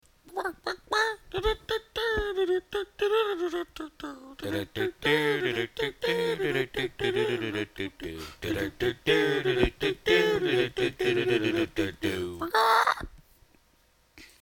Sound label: Robot; Tristan